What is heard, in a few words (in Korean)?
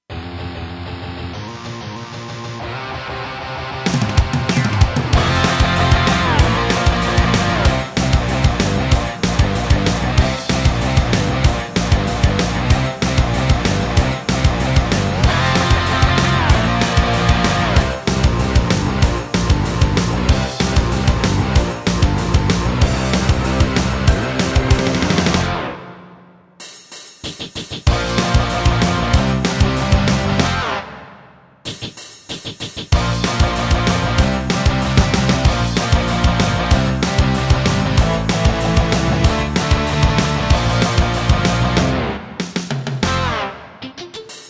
MR, 키 F